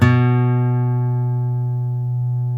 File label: STEEL B, FOLK STEEL Guitars; Spectrasonics; Hans Zimmer Guitars VOL-1